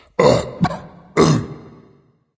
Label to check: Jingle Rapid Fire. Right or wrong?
Wrong